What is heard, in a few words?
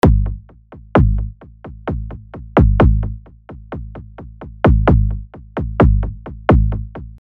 160 Drums Kit Loop